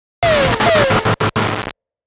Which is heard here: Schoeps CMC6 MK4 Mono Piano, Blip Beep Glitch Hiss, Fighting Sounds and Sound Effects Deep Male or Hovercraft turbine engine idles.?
Blip Beep Glitch Hiss